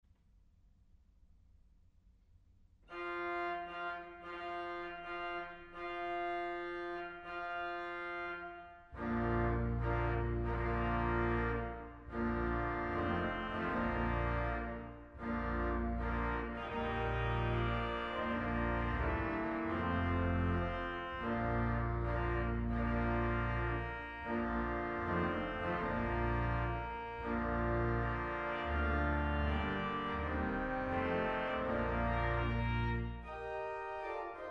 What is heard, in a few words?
Wagner Bridal Chorus, pipe organ